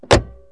Porsche 930 Door Closes, City Life, Autos; KABOOM! - Wild and Wacky Sound Software

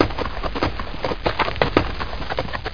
hoof

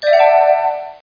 clothes

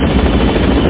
MAGMA; ASSAULT

